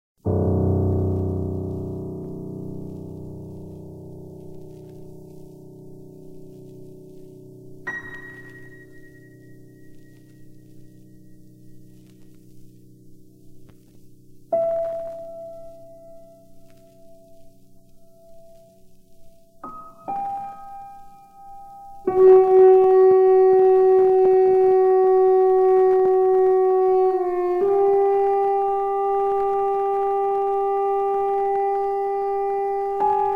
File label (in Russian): saksofona klavesina i udarnyih